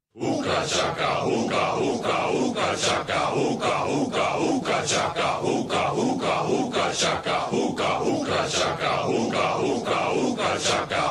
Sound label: ooga chaka